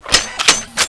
gun change start, weapons

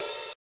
hihat; ze house of prayer

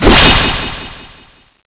magic knife charge attack

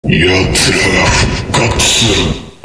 scream; nd escape; nemesis

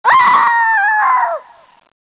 falling

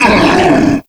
wolf die; wolfteam

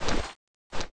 chain mg clip off, weapons

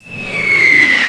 bunker buster whistling

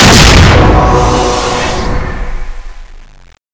wing gun shoot; zombie rush

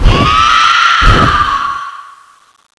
mask on; CSO Cronowing; cronobotics54

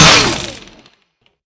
chainsaw end, weapons

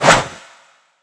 sword bombard fly

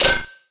chainsaw charge end, weapons

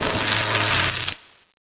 laser, sound/weapons

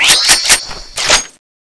jet gun reload, weapons

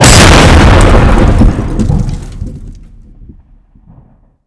hammer skill exp; knifeze

